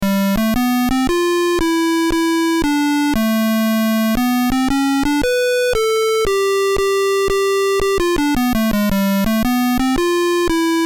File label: Melody (Player Piano); BASIC Manual